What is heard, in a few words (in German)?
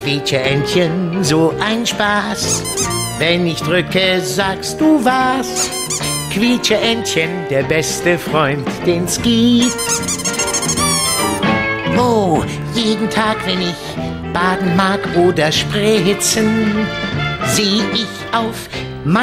quietscheentchen